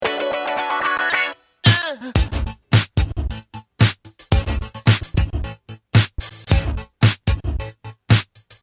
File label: loop back